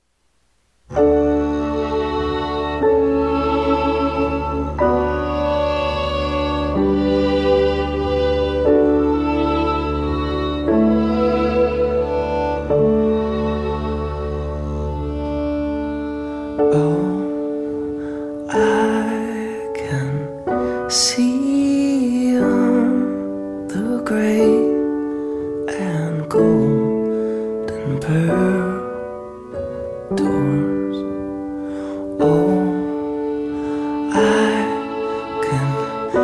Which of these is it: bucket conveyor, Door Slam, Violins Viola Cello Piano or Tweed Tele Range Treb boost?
Violins Viola Cello Piano